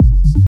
bumpy techno synth kick reverbed tail; one shots; kick drum samples

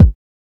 00s nice kickdrum f key; drum single hits one shots; kick drum samples

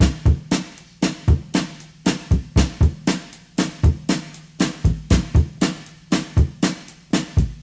126 bpm drum loop sample c key

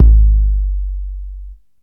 puffy kickdrum sound g key

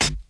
original high hat sample g key

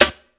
dirty acoustic snare sample c sharp key; 90s; snare drum samples

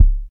sharp kick drum one shot c sharp key; kick drum samples

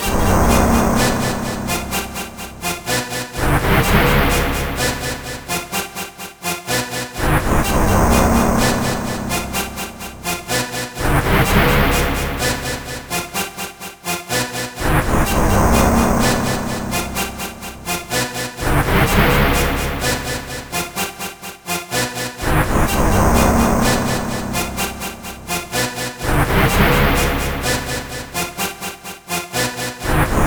rave sequence - Cm - 126, hardcore; spirit

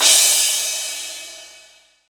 roomy single cymbal hit d sharp key

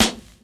snare single hit D key; snare drum samples

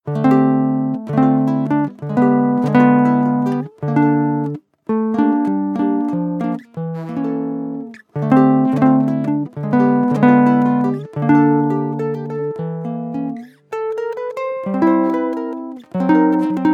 baritone ukulele jazz pickup sequence; loops

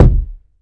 nice kickdrum sample e key; kick drum samples

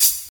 clear foot hi hat sound f sharp key; hat samples

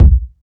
warm sounding kick one shot c sharp key; kick drum samples

